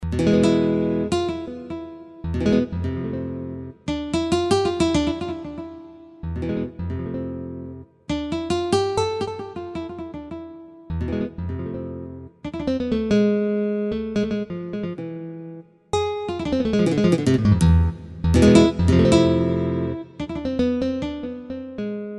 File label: Jazz Spain Guitar